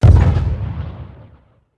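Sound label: spell alteration hit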